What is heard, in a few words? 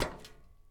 walk metal; Escape from Tarkov